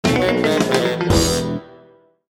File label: lose jingle; SpongeBob SquarePants WhoBob WhatPants